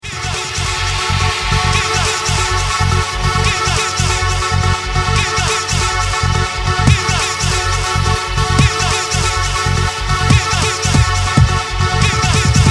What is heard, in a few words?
Remix